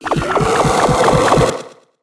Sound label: charge; fight knight gamerip